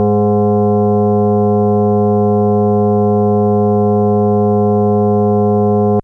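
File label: FM Sawtooth DX100 G sharp 2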